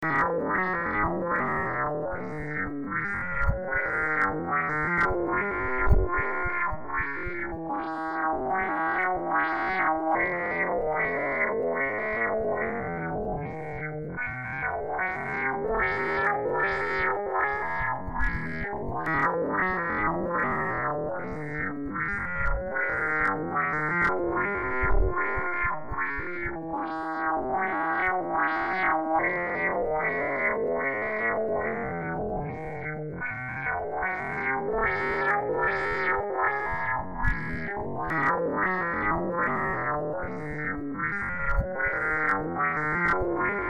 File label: noisy noise noise